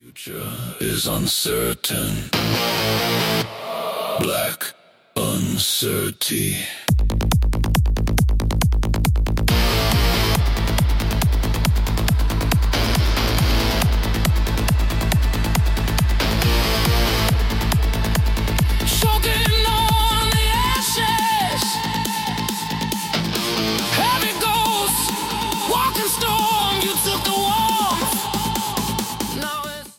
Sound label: hardstyle remix